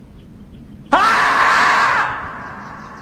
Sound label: screaming beaver; meme